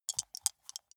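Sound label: small two rattle in hand closed short, dice; wuerfel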